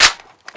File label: aa12 reload clipin